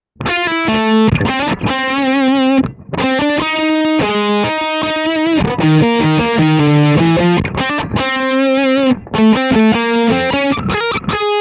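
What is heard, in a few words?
lead modern, Guitar Dry Sound – Guitar Wet Sound – Guitar + Band; USA Modern ’90 LEAD (Mic Pre Amp Neve); From 0″ Guitar Dry Sound – From 14″ Guitar Wet Sound – From 26″ Guitar + Band; Kemper Profile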